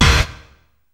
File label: POWER SH; BEAT2000